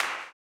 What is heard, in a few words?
CLAP, Blues Soul; Spectrasonics Vocal Planet